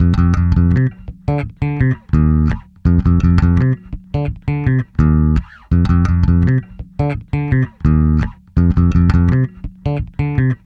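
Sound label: Pop E-Bass, F major; Pop Rock; Pro Tools Essential Loops; Digidesign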